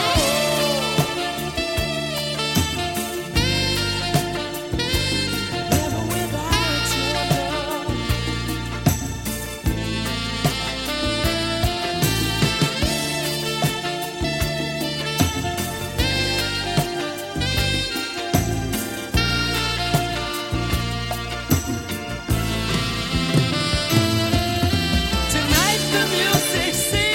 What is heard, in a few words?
romantic saxophone meme song